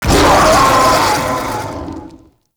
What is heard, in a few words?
Ghoul Spawn; Grineer